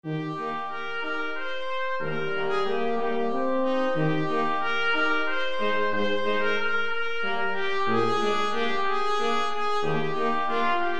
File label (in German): blechblasquintett